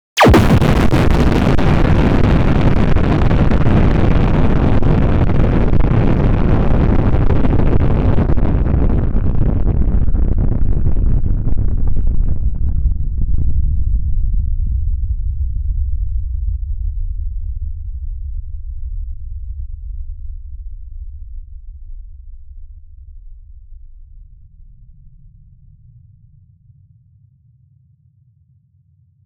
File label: nuclear blast sfx